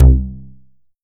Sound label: E Q BASS, Bass Analog & Synth, DISCO; USB Soundscan vol.12; AKAI